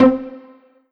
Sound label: Alesis Fusion Pizzicato Strings C4